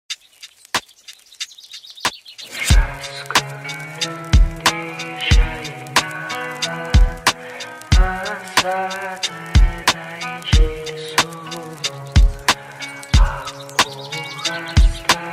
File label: lofi remix